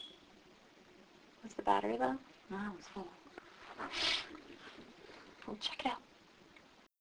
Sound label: Creepy